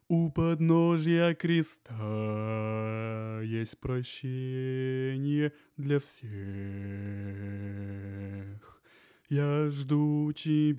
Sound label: bass